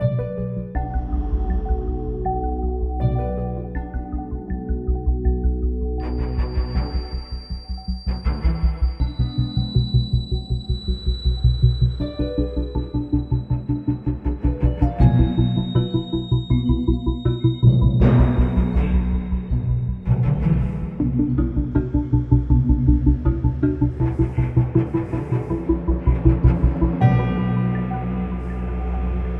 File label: Dungeon; Azure Saga Pathfinder; iOS, MacOS, Switch, Windows